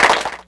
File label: Clapbox Pitch, Clap Box; Ableton Foundation FreePacks